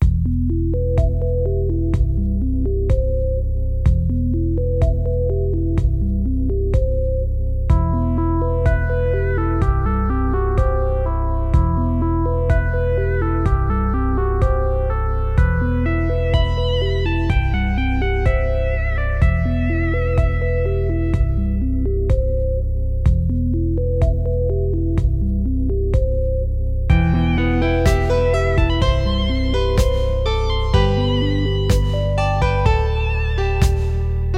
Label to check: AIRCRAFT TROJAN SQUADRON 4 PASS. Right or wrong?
Wrong